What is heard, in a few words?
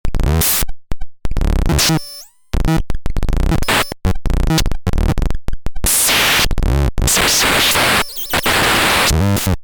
phone bypass spazz